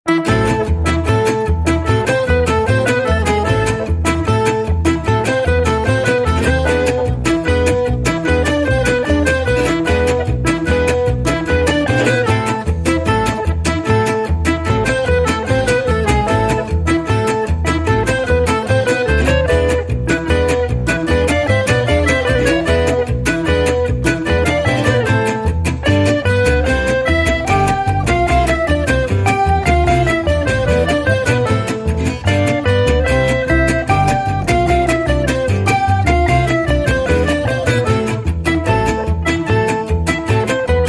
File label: Train Guitar, No Drums